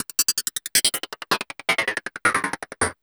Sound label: LOOPS